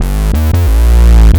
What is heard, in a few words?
SE-1 Overdrivin'; E-MU Producer Series Vol. 6 – Analogia Project; Strictly Synthe